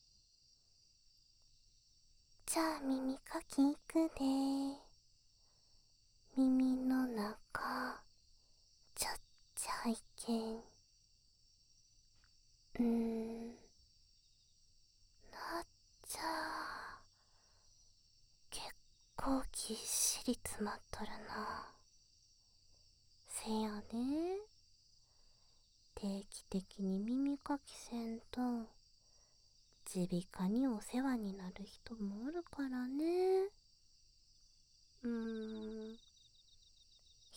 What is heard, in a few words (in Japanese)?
右耳かき; 耳かき旅館 猫屋敷 ～茶虎～